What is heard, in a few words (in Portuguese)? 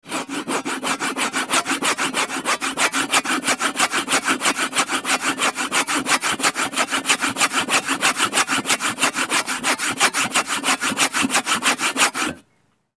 serrote; efeito sonoro